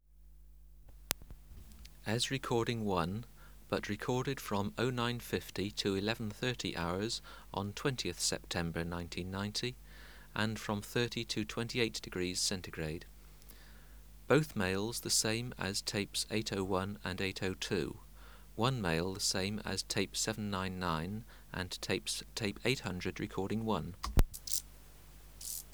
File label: Chorthippus brunneus